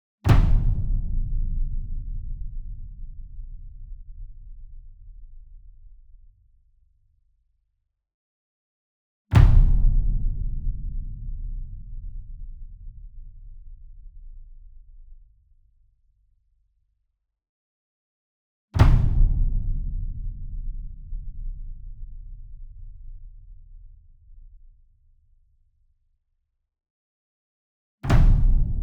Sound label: SINGLE DRUM Verdi 66 Inch Flam Hard Beater Near; boom library; Cinematic Strikes Construction Kit